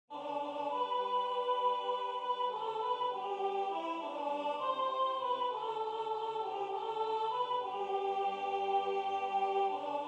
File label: Siyahamba African Song Soprano; Chant d'Afrique du Sud; Concert « Amour et Traditions »